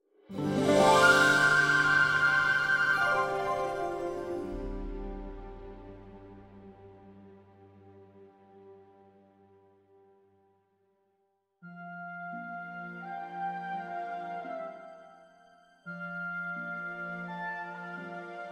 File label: Aviary (no ambient); Crossroads Inn